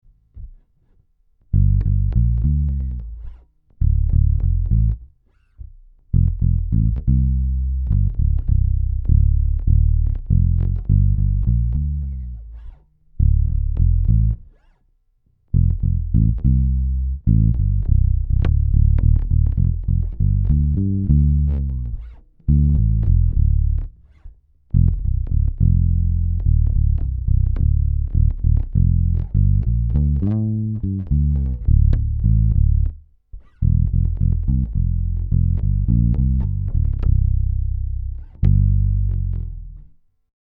Deep Groove

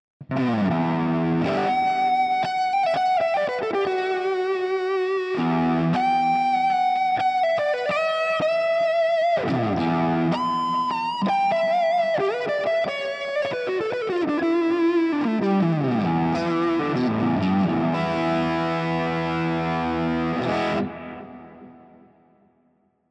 Comfortable lead

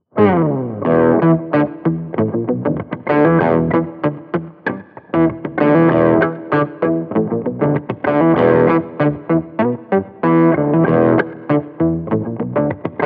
Peavey Vypyr X3 Octaver

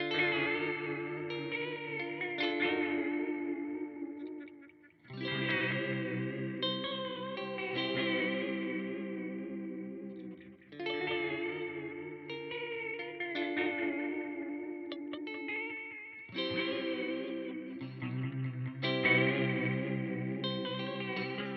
Way Huge Supa Puss Modulation

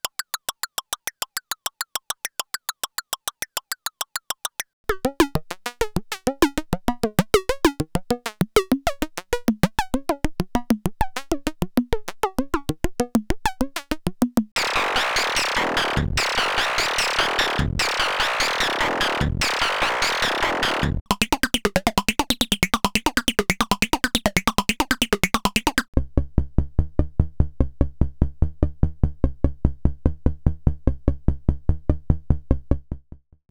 Taiko Loops; ALM Akemies

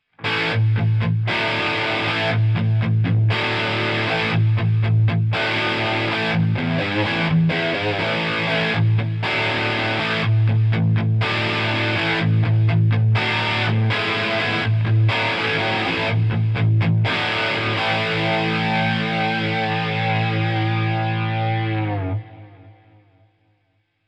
Zoom G2X FOUR Fat Rhythm

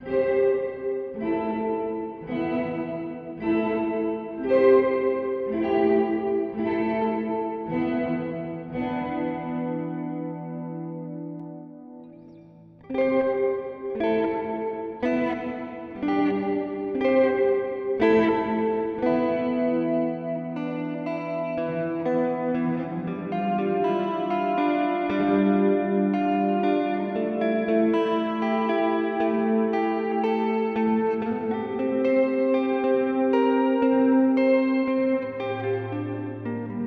Plate; Golden Reverberator

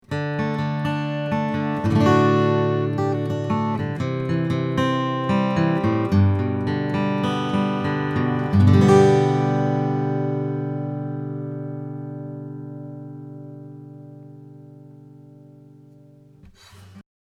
U67 Fig8 Ac Git Picking; RME UFX

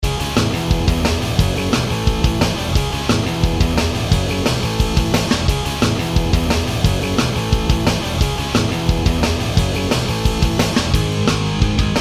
Punk Indie Rock

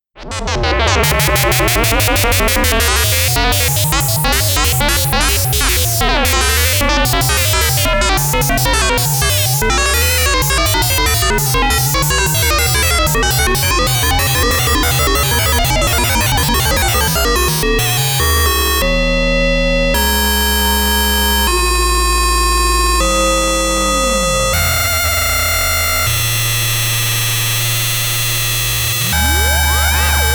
Micromac

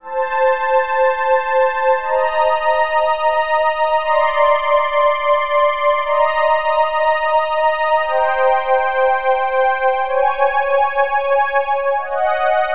Roland Cloud Juno-106

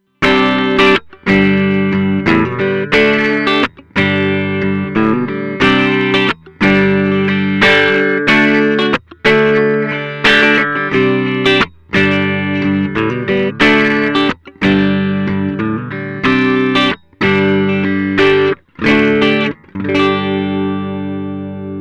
Tech 21 Sansamp Classic Clean

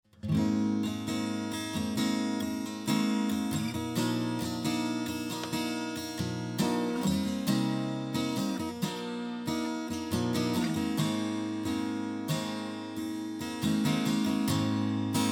114ce C414 Strumming; LTD

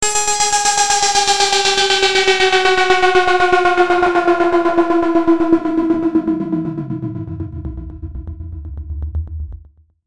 Falling Gate

